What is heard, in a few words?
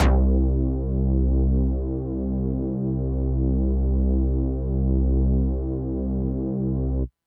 Synth Prophet Bass C; Electronisounds